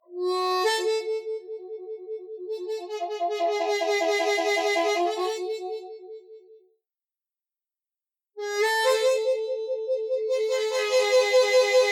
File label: bent trills